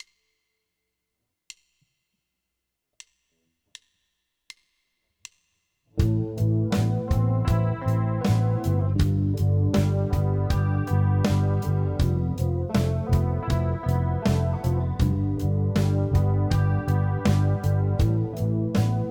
16 Bar Rock Solo

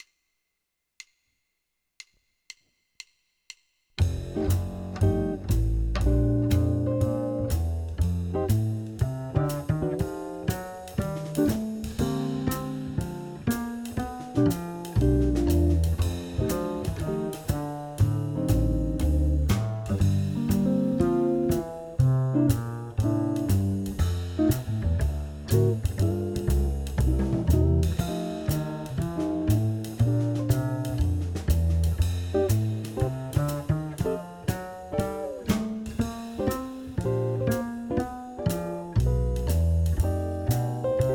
Jazz-Blues in F

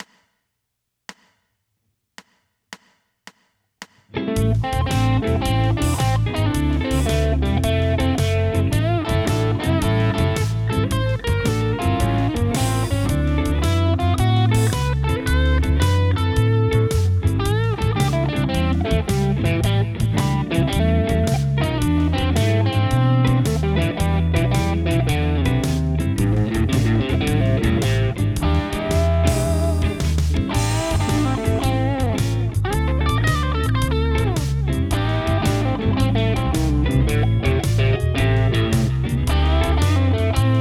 Blues Solo; Blues Solo 1 Example

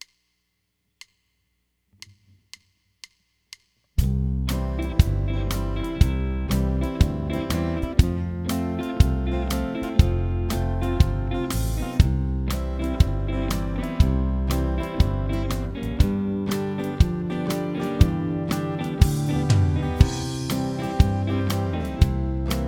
Country Solo Backing Track

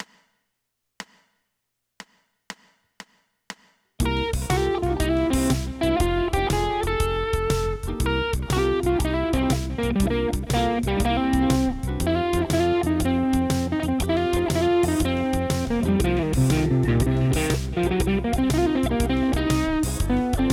Blues Solo; Blues Solo 4 Example

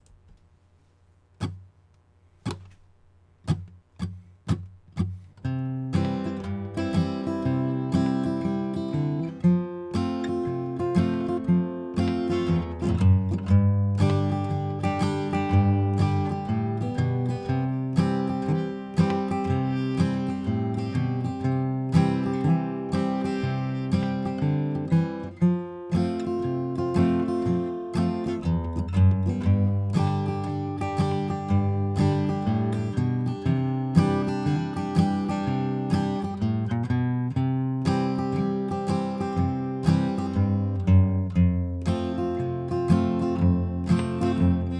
Bluegrass Solo Backing Track

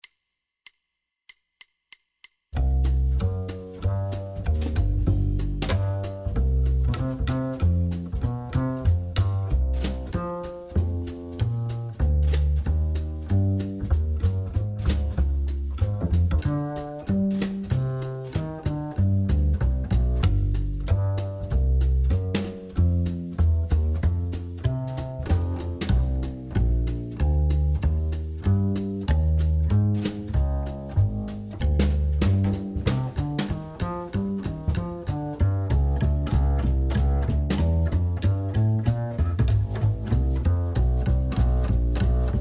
Bass Drums; guitar lessons on 12 standards book 4

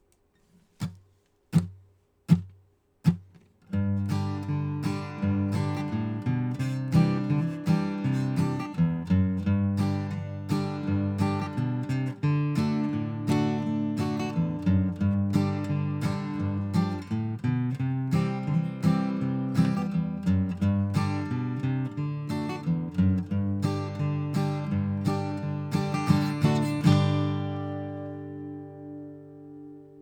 Bluegrass Solo Backing Track

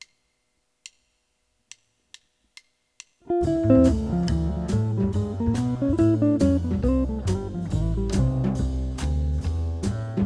II V I in C (b9)

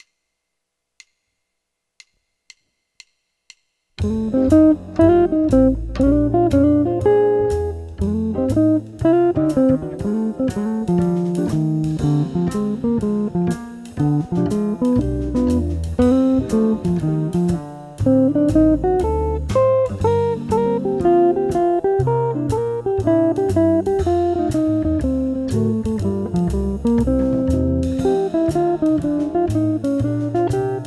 Jazz (Blues) Backing Track

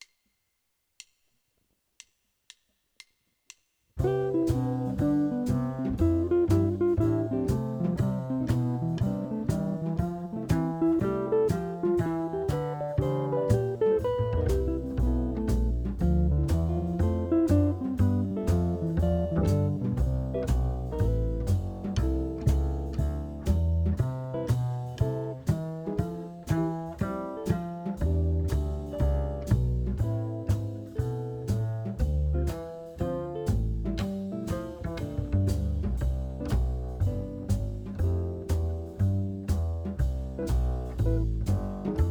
C Diatonic Progression

